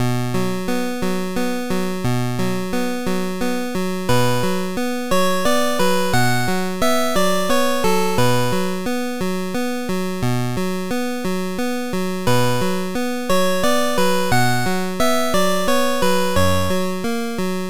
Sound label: 8bit